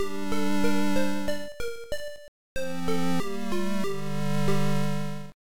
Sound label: Game music; Manic Miner